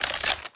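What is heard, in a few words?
inspect movement; Valorant; cstrike; bulldog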